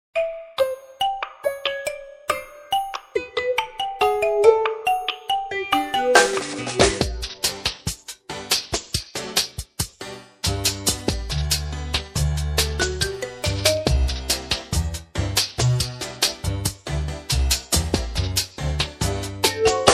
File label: Slap!